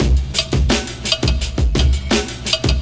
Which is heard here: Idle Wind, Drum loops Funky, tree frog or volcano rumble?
Drum loops Funky